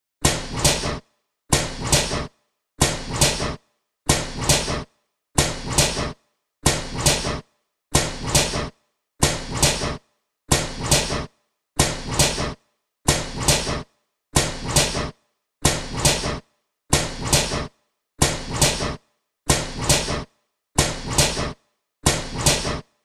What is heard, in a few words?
Pressing Machine, Pressing machine loop.; Presses; Machines